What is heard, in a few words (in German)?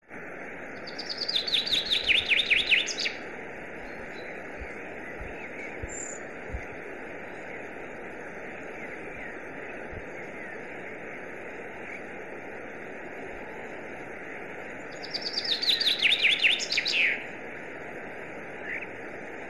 Buchfink Gesang